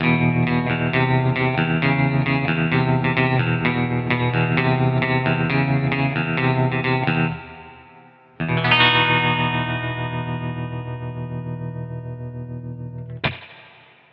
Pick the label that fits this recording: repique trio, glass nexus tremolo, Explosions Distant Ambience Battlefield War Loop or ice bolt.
glass nexus tremolo